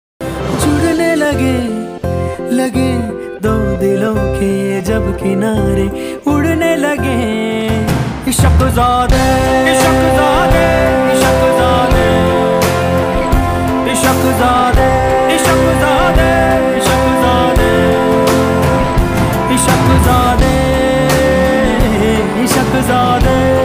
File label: love ringtone song